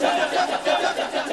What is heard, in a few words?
ETHNIC SHOUT; AMG; Global Trance Mission VOL-2